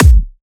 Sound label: kick drum single hit a key; Duration 0:01; kick drum samples